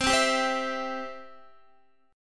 C Major, Guitar; strummed; JGuitar

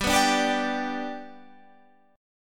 G Major, Guitar, Standard; G strummed

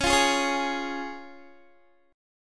C sharp Major, Guitar; strummed; 21,20,18,18,20,x

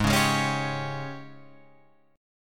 Ab Major 7th Suspended 4th Ab Guitar Open F; AbM7sus4; strummed

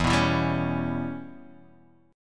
E Minor 6th E

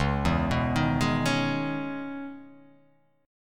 C sharp Minor 9th, chord; x,4,2,4,3,3,3,x

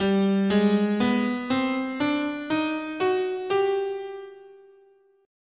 G Double Harmonic Major, scale

